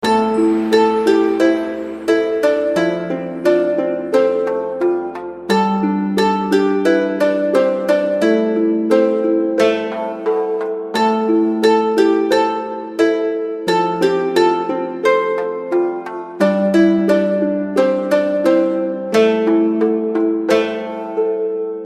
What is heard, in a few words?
japanese zen